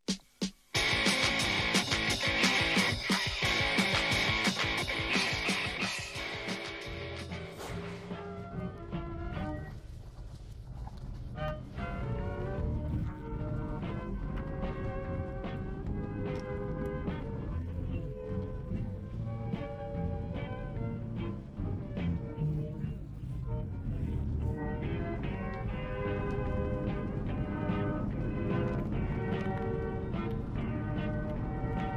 no vocals; blood juice - angry kid

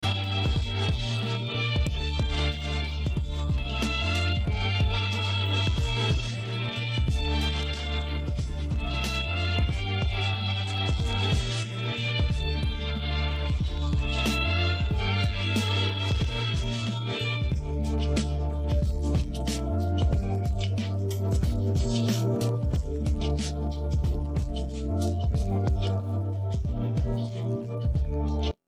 no vocals

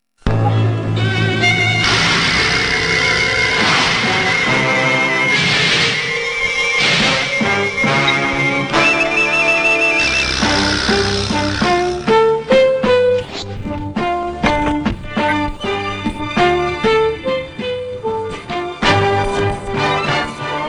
no vocals

